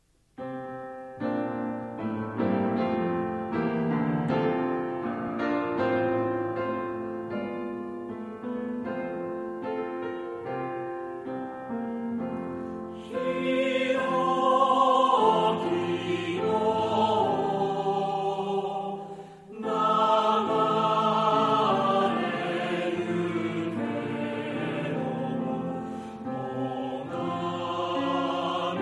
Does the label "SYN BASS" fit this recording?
No